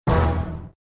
bonus; Sheriff Of Nottingham

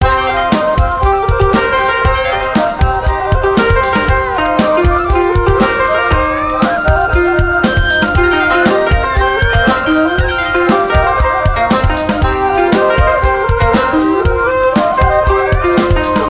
free spin melody; Lucky Coin

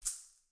line toggle

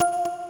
crystal scatter; Lucky Fortune; Wazdan

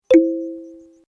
Spin; Hot Spin; CQ9

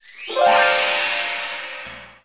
5 of a kind

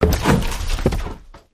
popup hide; Racetrack Riches; megaboard

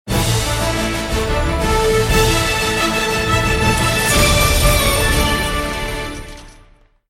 WIN MAX; Temple Of Secrets

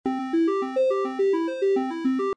reel run; American Poker The Legends II

